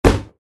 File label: Thud